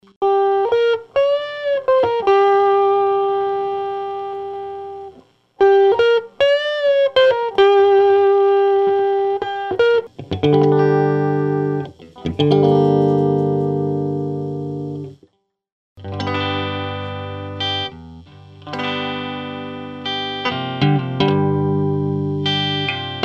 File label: amp head blues cords and cry; dr zee se cdc amp head